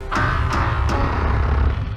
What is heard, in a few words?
laugh; Mechagodzilla